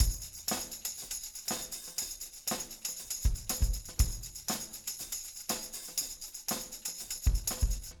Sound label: 120 tam